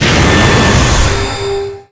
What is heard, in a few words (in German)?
Ruf